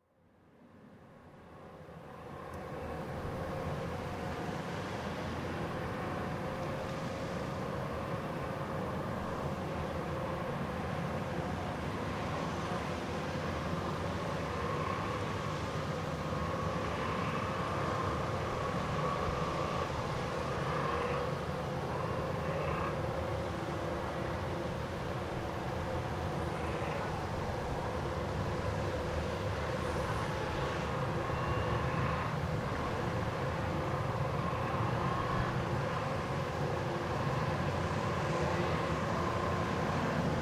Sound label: Tanks, Tanks approaching & manoevering in mud; Vehicles; BBC